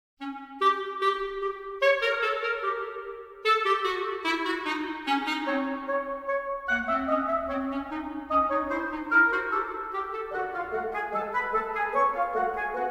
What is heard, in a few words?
wind variation, Orchestral, with percussion variation; lhomme arme